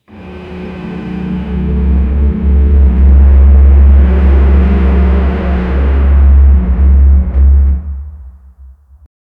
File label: dark stormy ocean roaring